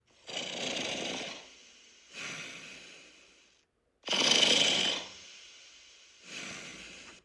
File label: light snoring